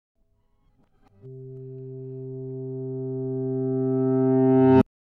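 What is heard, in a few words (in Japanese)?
Nylon弦 C3 逆転, ギター時間軸逆転音; 時間逆転音; 減衰音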